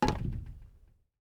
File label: glass land; teardown; ps5 windows xbox series xs